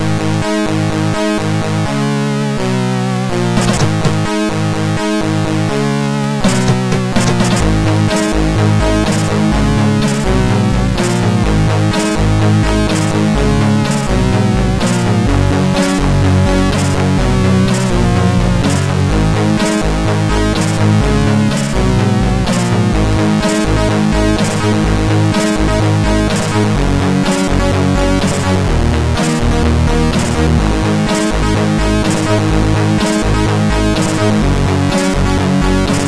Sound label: Heavy Intro Tune, MOS6581R4; The Dungeon Master